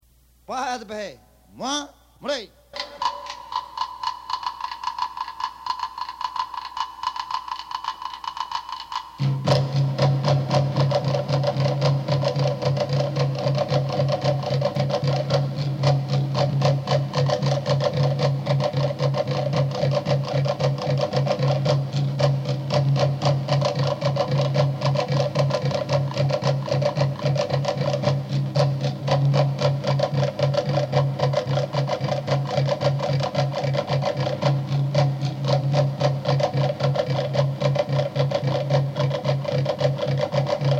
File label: Drums of Bora Bora, Musica etnica Thaiti